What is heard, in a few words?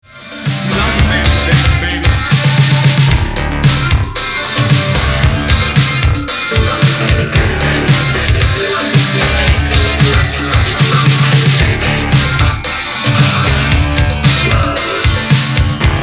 extended vocal mix